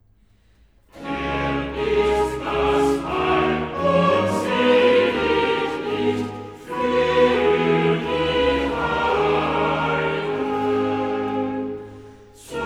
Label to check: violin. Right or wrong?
Wrong